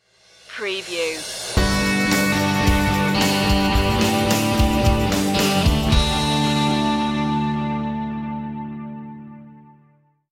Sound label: pop celebration stinger